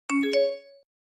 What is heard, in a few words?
notification